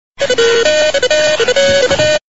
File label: nothing phone 1 led ringtone